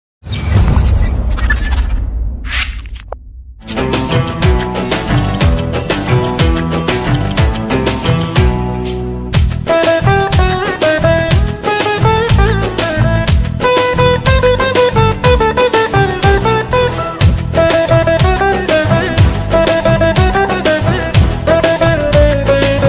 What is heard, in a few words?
ringtone bgm